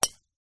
Golf Driver

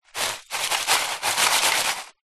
food biscuits crumbs pieces movement foil tray

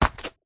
foley footstep single barefoot walking cardboard; zapsplat

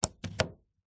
electrical plug into wall socket; household

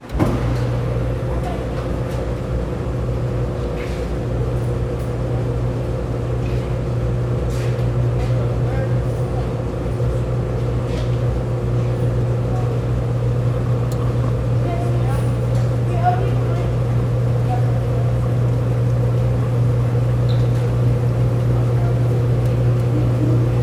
household washing machine cycle close recording; felix blume

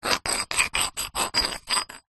metal bars small x2 scrape together; foley

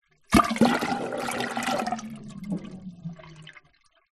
household bathtub drain gurgle

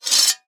warfare sword draw from sheath fast; zapsplat

